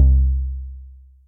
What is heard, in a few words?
Electric Bass; Ableton; Factory Packs; Synth Essentials; Collision